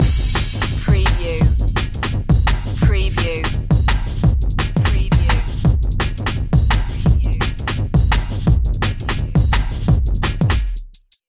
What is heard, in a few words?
high contrast loop